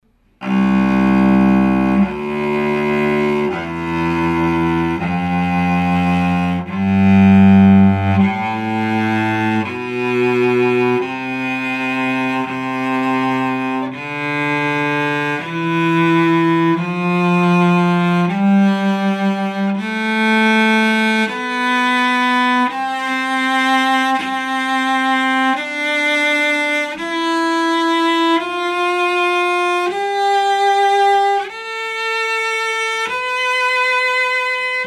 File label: cello; SoundPodium